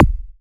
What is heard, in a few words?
KCK, Yamaha TX81Z synth drums